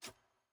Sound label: sfx ui generic section microfiche hover